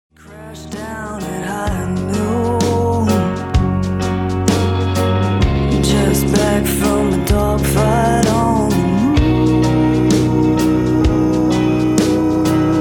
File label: Rhodes Synth Guitar